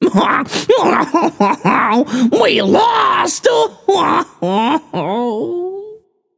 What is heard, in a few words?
boohoo, voicepack; smite; jorm